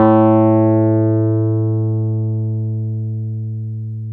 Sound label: Wurly; Keyboards of The 60's and 70's